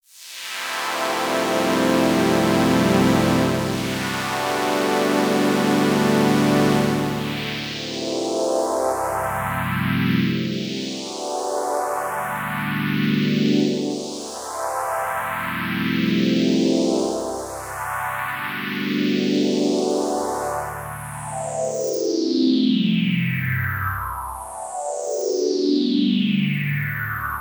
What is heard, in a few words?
Synth Pad; Whirl